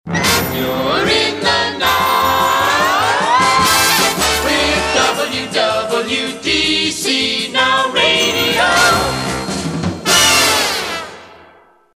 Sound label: Riding High; WWDC; PAMS; PAMS Numbered Series Samples